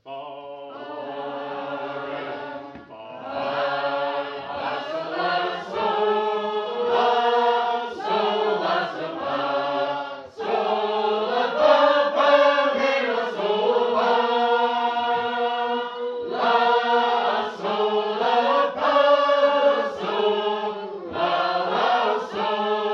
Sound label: Liverpool med lo key, sacred harp